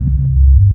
Bass - 5A, Jungle; Blu Mar Ten; Jungle - 1989 to 1999

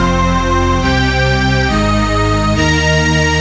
Pad, Jungle; Blu Mar Ten; Jungle Jungle - 1989 to 1999 Samplepack

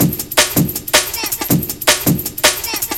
Sovreign Break; Jungle; Breaks; Blu Mar Ten - Jungle Jungle - 1989 to 1999 Samplepack

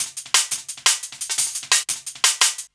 Beta Break, Jungle Breaks; Blu Mar Ten - Jungle Jungle - 1989 to 1999 Samplepack

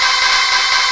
Drumz Ana Hit; Jungle; Blu Mar Ten - Jungle Jungle - 1989 to 1999 Samplepack

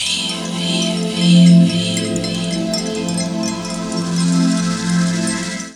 Vocal Pad, Jungle; Pads; Blu Mar Ten; Jungle - 1989 to 1999

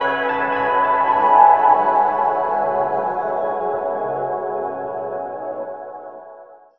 12A, Riffs, Arps & Hits, Jungle; Blu Mar Ten - Jungle Jungle - 1989 to 1999 Samplepack

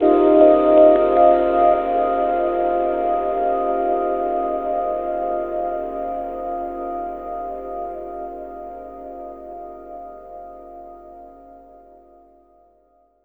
Beep Rhodes; Jungle; Blu Mar Ten - Jungle Jungle - 1989 to 1999 Samplepack

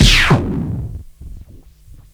Spindown; Jungle; FX; Blu Mar Ten - Jungle Jungle - 1989 to 1999 Samplepack

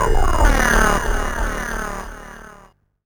Hit

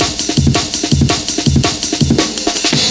Amen; Jungle; Breaks; Blu Mar Ten - Jungle Jungle - 1989 to 1999 Samplepack

